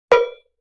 Cowbell Mid Damp; Vintage Cartoon; Drums & Percussion

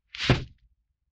Swish Broom Hit; Vintage Cartoon; Hits & Tones

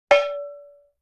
Cowbell Mid; Vintage Cartoon; Drums & Percussion

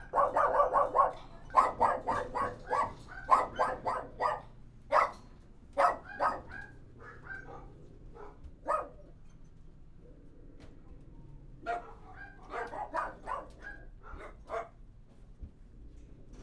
Kennel Interior

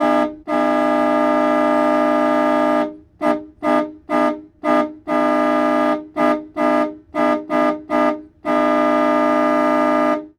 1947 Ford V8 Truck Horn Multiple; Period Vehicles

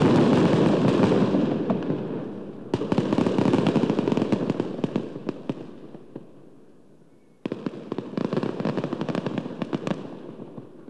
Fireworks Distant

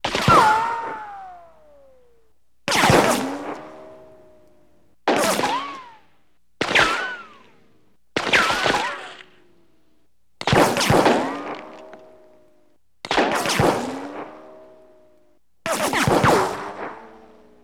Ricochets Off Wood; Weapons & Explosions

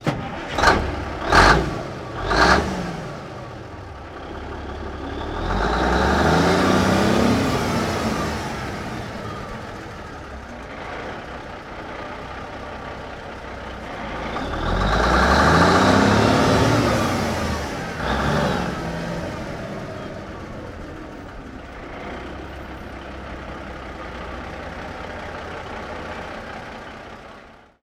1954 Big Rig Int Start Rev Idle, Period Vehicles

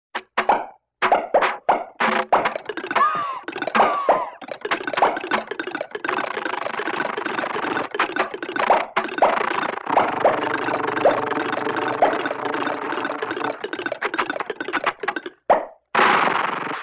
Comedy Motor, Vintage Cartoon Foley

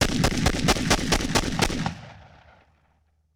Benelli 12 Gauge Shotgun Blasts 8x; Weapons & Firearms

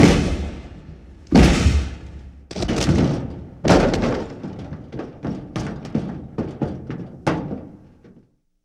Fight Sequence, Hand To Hand Combat

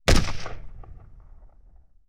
AK-47 Single Shot; Weapons & Firearms

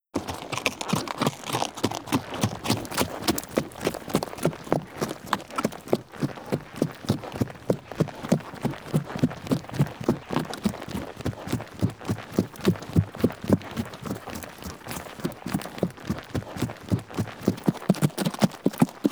Horse Walk Trot Steady

